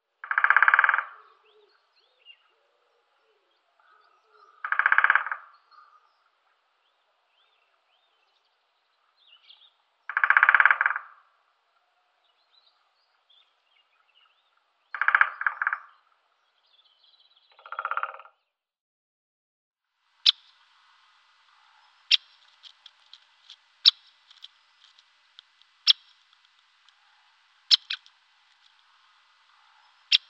Buntspecht Dendrocopos major

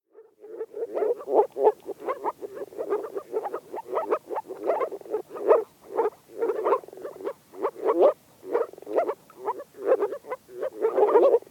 Moorfrosch Rana arvalis Balz

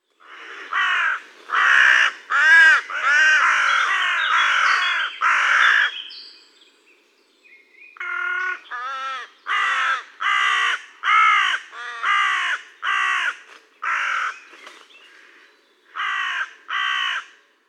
Rabenkraehe Corvus corone

